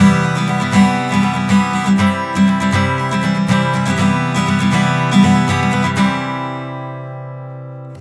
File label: Strumming; Сэмпл гитары (Rock Alternative); 120 BPM; Guitar; loop; рок